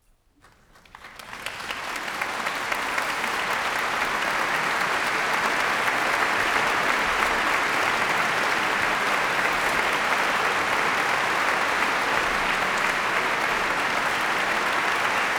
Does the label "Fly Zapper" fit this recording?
No